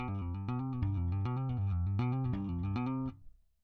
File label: bass